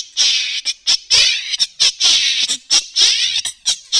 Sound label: flange 45